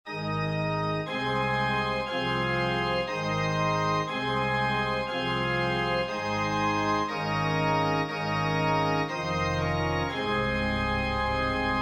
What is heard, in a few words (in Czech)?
pisen varhany; lurdska pisen; lourdska pisen